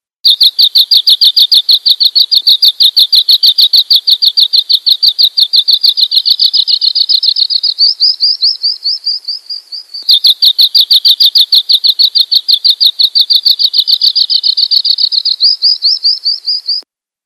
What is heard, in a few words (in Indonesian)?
sanma; omkicau